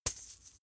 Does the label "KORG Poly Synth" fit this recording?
No